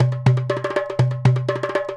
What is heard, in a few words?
Tablah, Roland BK-5; Loops & Phrases